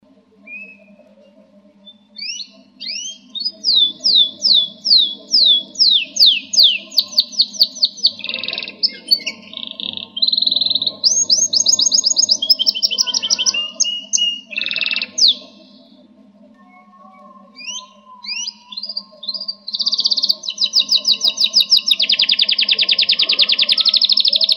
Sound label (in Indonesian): kenari; rasmibolan